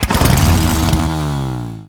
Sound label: Infested Hammer Accent Rev, Weapons